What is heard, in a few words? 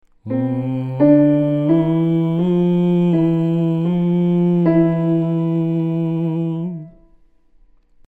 Open Mouth Hum